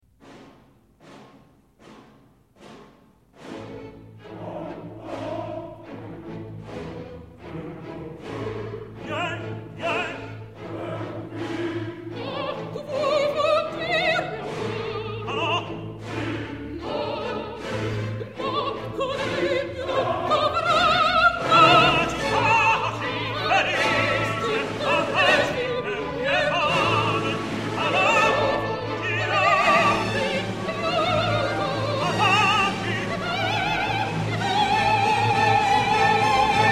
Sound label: alto; bellini; i puritani